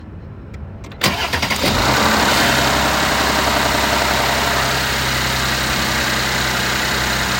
ticking noise only when hot cold start, c63 amg w204